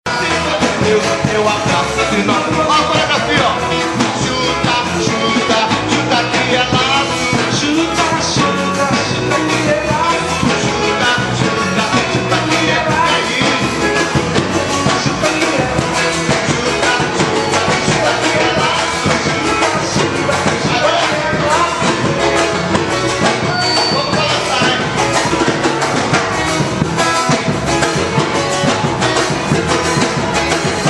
medley ao vivo

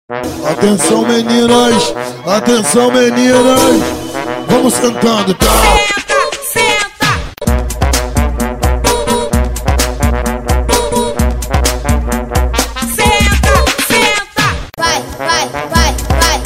funk digital

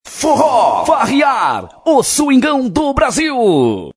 vinheta

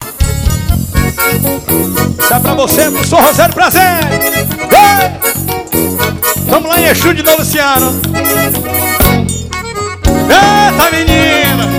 baião

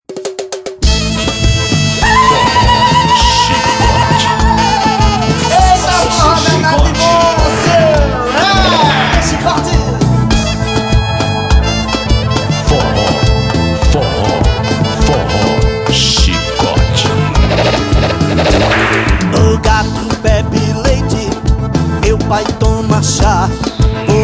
forro

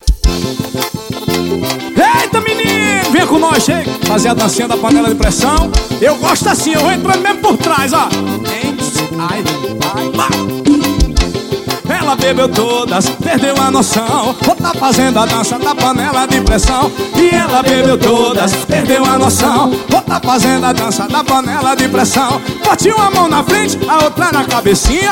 forró; cleitoncds

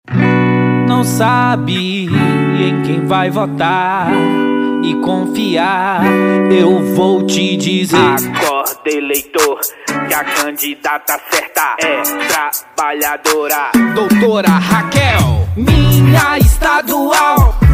jingles politicos; eleicao 2020

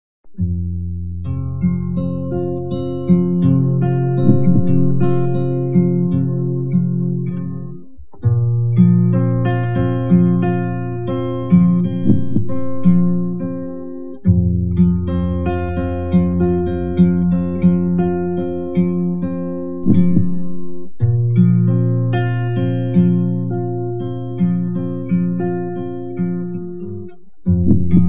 vem em mim saudade instrumental

